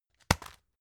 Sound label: tissue package drop hard